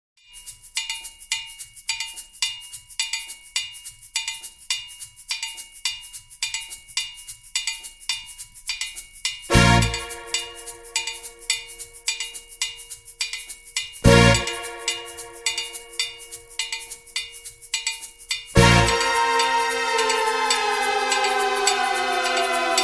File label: minus drums; play along drums rock pop and blues